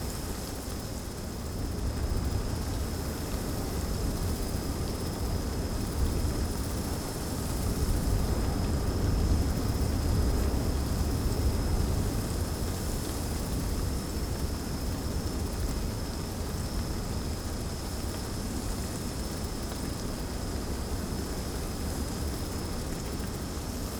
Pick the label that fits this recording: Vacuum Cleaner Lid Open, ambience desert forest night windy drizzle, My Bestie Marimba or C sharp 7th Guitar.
ambience desert forest night windy drizzle